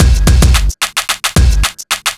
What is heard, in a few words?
block rock kit